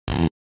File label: Plastic squeak and creak